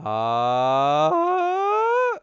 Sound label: Register Break